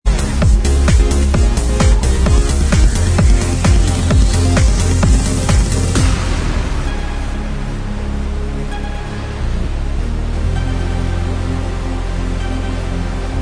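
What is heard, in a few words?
extended dance remix instrumental track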